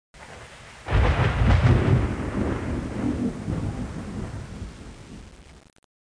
STORM; ambient; weather

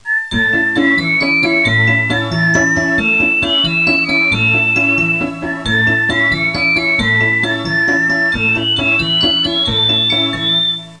carnival theme; Sticker Stories; Edmark

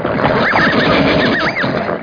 charge; dragoon

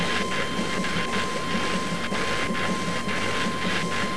Puzzle Complete Loop; mTropolis; mTutorial Media

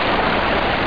AMB STRM; Creative Voice

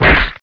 connect; Outlaws; PC Gamer 43

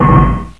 metal hit roll; Sound Effects for Multimedia - Volume 1

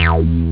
synth down

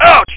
ouch; BallBench

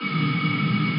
ion normal, boosters; axis

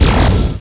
Fzzz; Johnny Mnemonic Interactive

